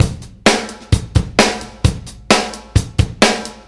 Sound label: Rock, Drum Loops